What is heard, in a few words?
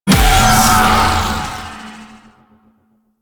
Sentient Aerolyst Clap Ability Cast; Warframe; Sentients